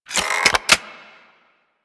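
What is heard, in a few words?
Primary crossbow Reload Ready; Warframe; Tenno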